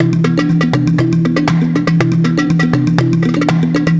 malimba; ゆめ2っき

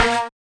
marimba steeldrum; ゆめ2っき

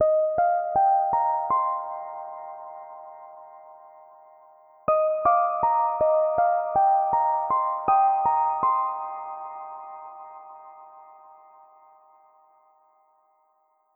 piano; ゆめ2っき

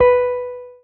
marimba guitar; ゆめ2っき

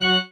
marimba grave; ゆめ2っき

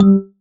marimba raincoat; ゆめ2っき

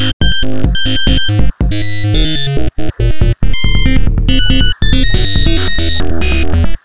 bgm, Music; ゆめ2っき

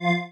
marimba spacesuit; ゆめ2っき

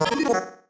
marimba

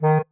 marimba penguin; ゆめ2っき

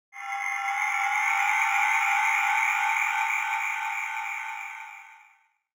Elevator Up

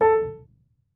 marimba piano

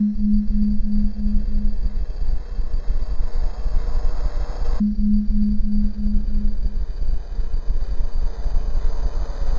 bgm, Music; ゆめ2っき

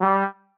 marimba trombone; ゆめ2っき

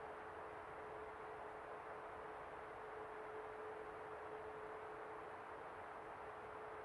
drone; ゆめ2っき

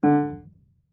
marimba piano; ゆめ2っき

